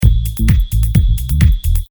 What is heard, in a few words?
simple house drum loop